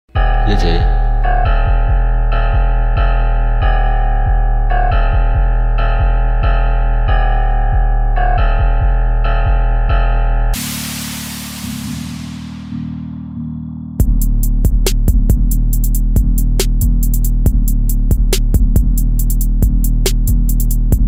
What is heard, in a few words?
Instrumental